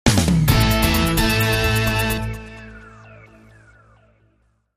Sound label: Headlines Sting